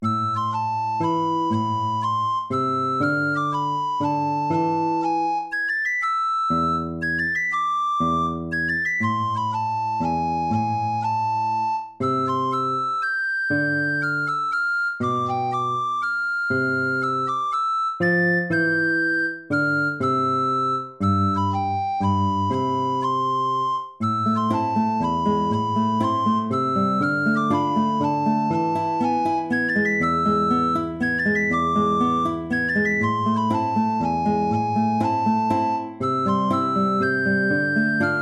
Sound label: baroque minuet minor